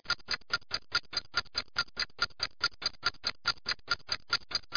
clk tick

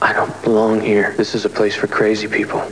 GEAR GRND

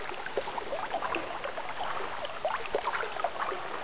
WATER; CHASM

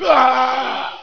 H DIE; CHASM95

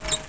Door; Alice - An Interactive Museum; Door-Kee

